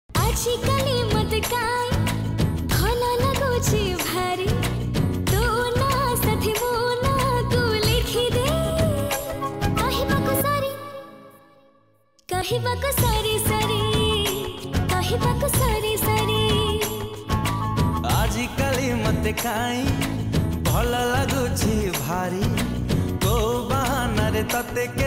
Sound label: Love Story Song Ringtone